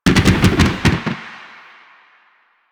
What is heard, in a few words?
Slide Boost Backfire, Motorcycle; Motorcycle Ninety Nine